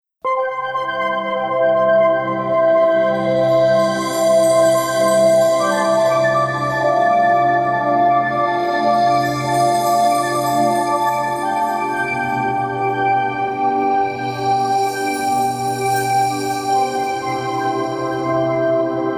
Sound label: Wind Swept Shore